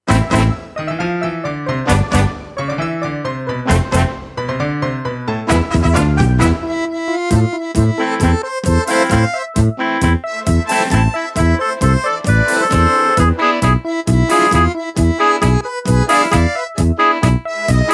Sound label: tango